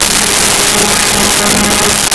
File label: Strings Ab3; Nintendo; July 2020 Gigaleak